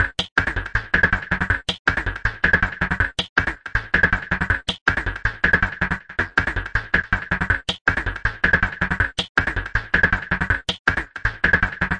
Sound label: 159 pitter-patter-synth, synth and electronic loops